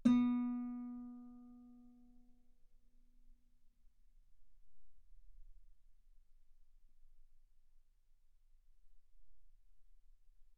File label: Guitar ff B3